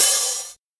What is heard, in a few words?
bright open hi hat sample a key